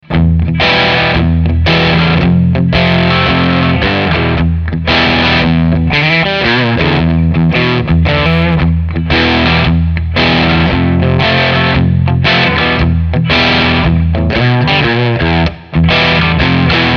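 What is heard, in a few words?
Drive Channel Maximum Gain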